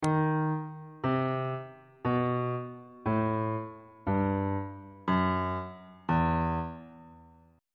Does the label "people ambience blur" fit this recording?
No